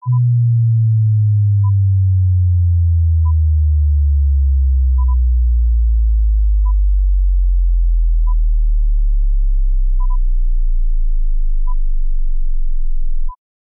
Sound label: Sweep 125-20 Hz, pink noises